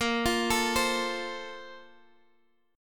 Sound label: Bb-Major 7th Suspended 2nd, Guitar, Half Step Down